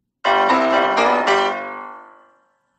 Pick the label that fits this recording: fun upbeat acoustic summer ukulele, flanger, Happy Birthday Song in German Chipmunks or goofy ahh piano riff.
goofy ahh piano riff